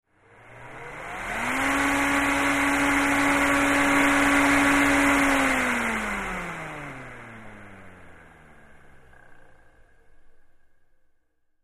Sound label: Electric Turbine, power on off